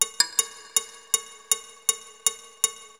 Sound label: PERCUSSN, Ambient, Drum